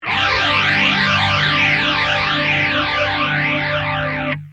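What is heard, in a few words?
guitar rph10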